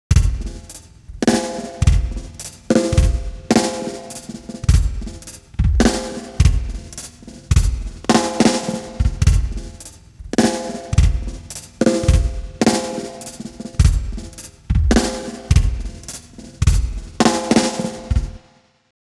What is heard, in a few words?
drums, timestretch, 200; ikm sample tank ps ts